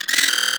Vocal Pain; Hyena Micro

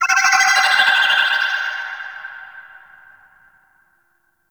Mine Drone Underwater Vocal; Warframe; Grineer

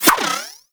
Skip Rope Whoosh; Warframe; Characters; Pets; Moa; Tenacious

